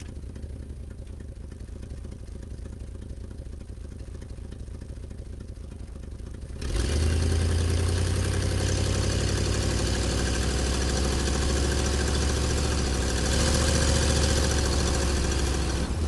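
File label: auto interior onboard drive fast idle away turn stop reverse turn; sonniss gdc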